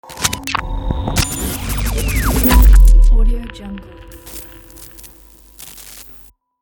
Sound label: the glitch logo